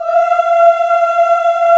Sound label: VOX, African Aahs; Roland LCDP11 Africa VOL-1